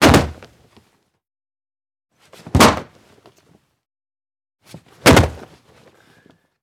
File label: Hard Slams x3